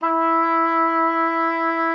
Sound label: E4, OB D.AMORE; Xsample VOL-7